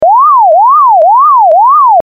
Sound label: Siren